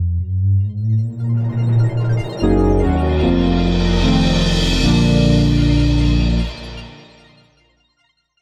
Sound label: Retro Brassy